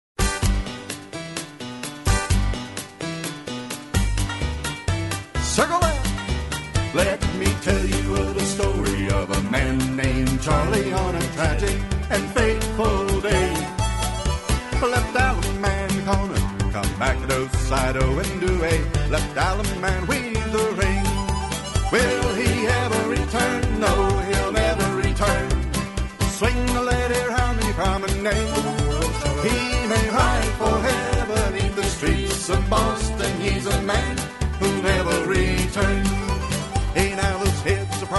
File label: vocal